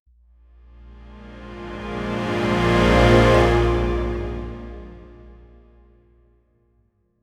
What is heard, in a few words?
orchestra woosh with fade